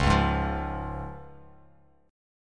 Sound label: C sharp Major, Bass Standard; 9,4,6,10; strummed; JGuitar